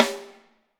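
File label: snare drum, Drums; Bob Clearmountain Drums I; SD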